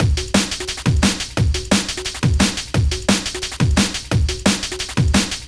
D&B Drum Loop; neuro

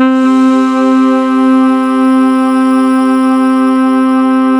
SAXY C3

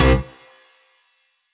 Dance Mega Synth; Best Service; 90 s Sample CDs

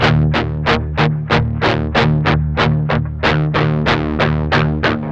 med rock 8th, guitars

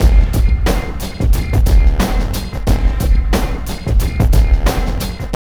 TRIP 90, trip loop

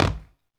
STAM, Human Perc, Body Perc

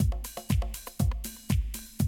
Classic Drum Loops

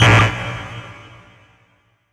SHORT, SHORTNOIS

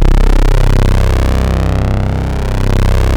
OB C2 -R, Rare Synthesizer; Hollywood Edge - Giorgio Moroder Rare Synthesizer Collection; OB 4 VOX.

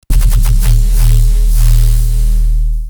Dist LFO Tones; neuro; BIG5AUDIO

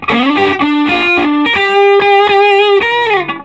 SOLO G, Vintage Blues Guitar; USB Soundscan vol.22

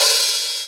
club HH, Roland SPD-S

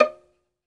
SOLO VI, Synclavier Strings; ILIO

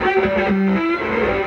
Electric Guitar; Sonic Foundry (Sony Creative Software) - Friendly Fire; Serpent

